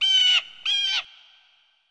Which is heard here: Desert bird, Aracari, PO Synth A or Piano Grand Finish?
Desert bird